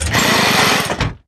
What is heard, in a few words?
lumberjack drill; inferno